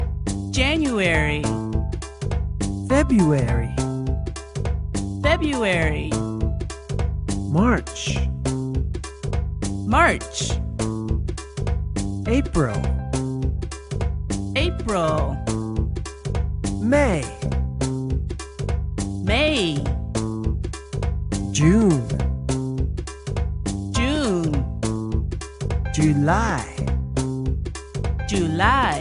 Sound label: Chant